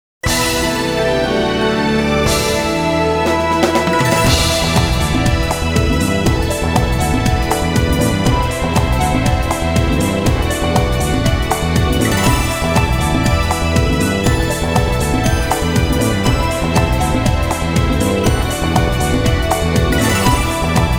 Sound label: Battle Victory; .hack//G.U.